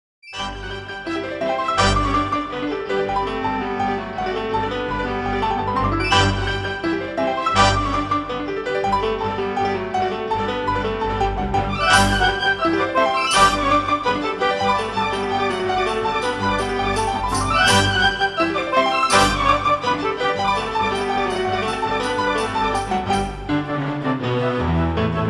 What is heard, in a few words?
Russian Dance